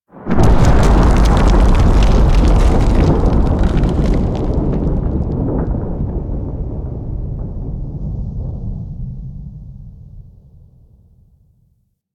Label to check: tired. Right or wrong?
Wrong